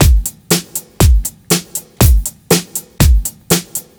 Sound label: simple hip bit beat